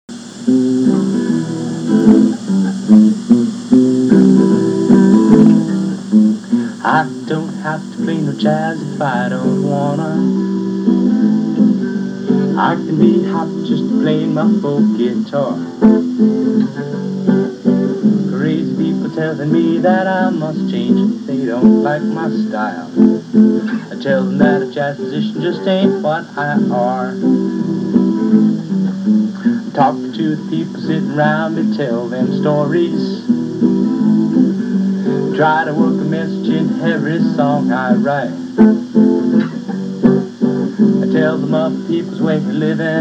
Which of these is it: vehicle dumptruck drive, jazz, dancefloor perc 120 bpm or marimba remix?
jazz